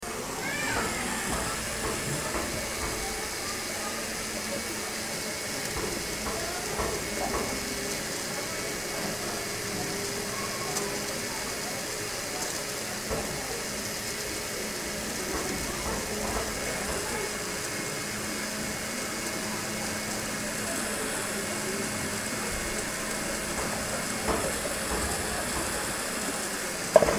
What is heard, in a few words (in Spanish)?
peru cusco urubamba mercado